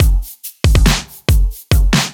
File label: Kit 7 Odd Sig 140; off the grid